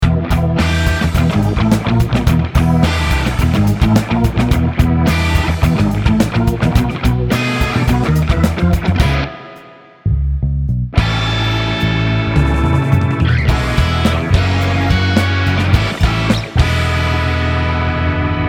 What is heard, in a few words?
Light Organ